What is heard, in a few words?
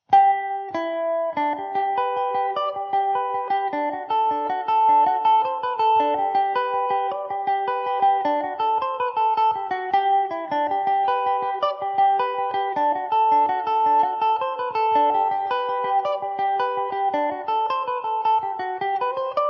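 G major, Irish mandolin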